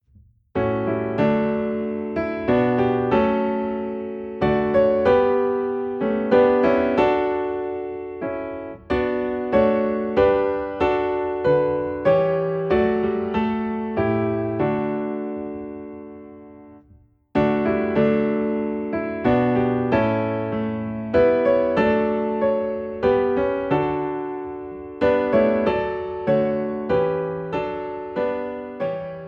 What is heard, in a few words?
Piano in D